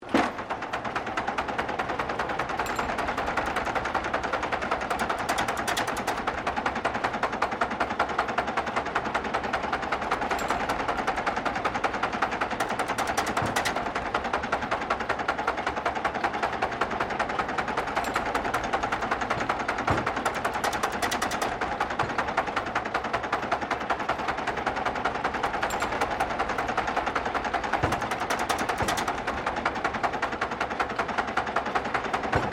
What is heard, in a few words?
Wheelwright Hub Morticer Operating, Colonial era machinery; Machines